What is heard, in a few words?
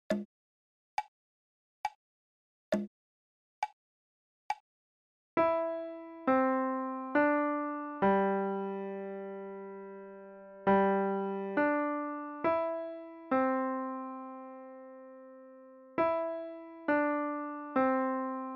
Chimes Slo